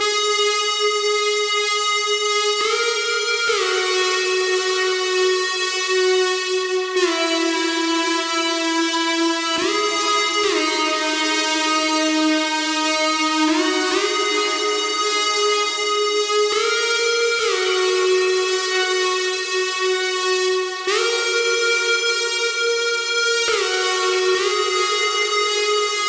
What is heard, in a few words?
EGuitar WET 138BPM, G# minor; Bassline & Melody; Vengeance Samples; VTS1 Zodiac Kit